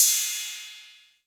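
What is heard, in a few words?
TR606 Cymbal; Drumset 02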